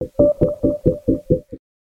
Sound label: cowboy signal